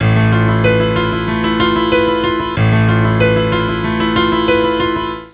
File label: dark piano